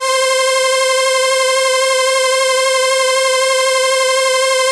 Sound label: SYN, ENSEMBLE